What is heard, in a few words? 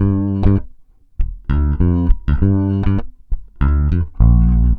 bass G, bass baion